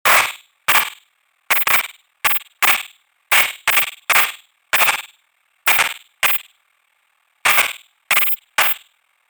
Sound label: neon